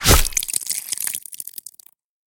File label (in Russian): krov briznuvshaya iz glubokoj rani posle udara machete